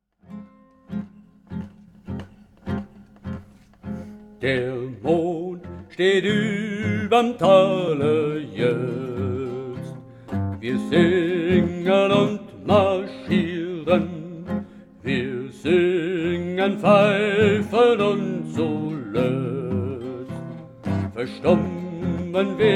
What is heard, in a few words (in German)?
f-moll; nachtmarsch 1